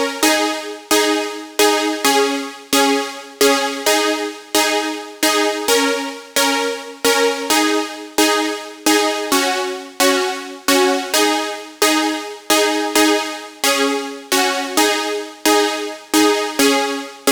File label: Mainlead DRY, 132BPM, G# major; Vengeance Samples; Jericho Kit